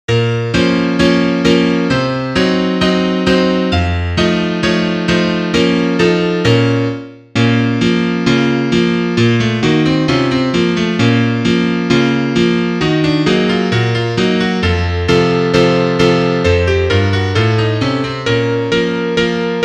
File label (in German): Klavier Solo, trompete; Duett in c - Moll; (2. Stimme)